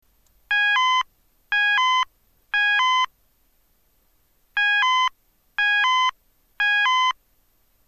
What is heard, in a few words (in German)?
ton, Signalgeraete